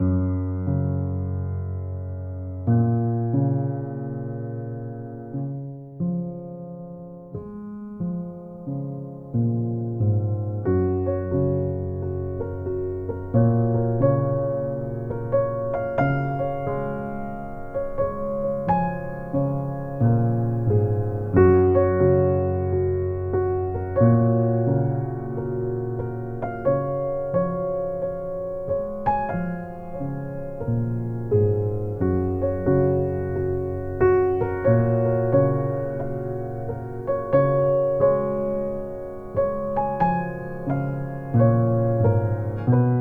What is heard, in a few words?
Piano Preludes